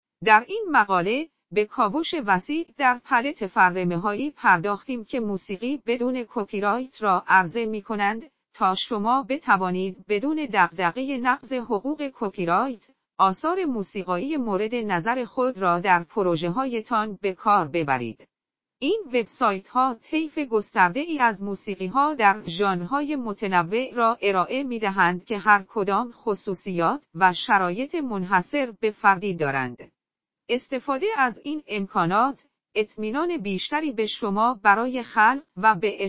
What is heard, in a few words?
Music without copyright